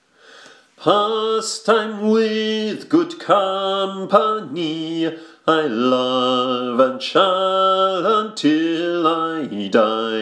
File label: Bassus; Pastime with good company